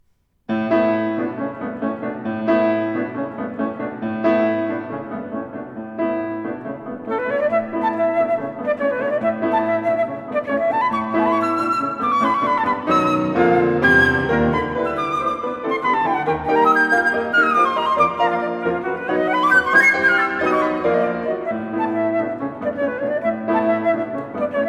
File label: Flute Sonata Movement 4; IV. Allegro con brio